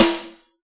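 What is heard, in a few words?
big beat kit snare; Dr 202 Kit 1; Percussion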